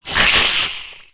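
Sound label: King Spider Attack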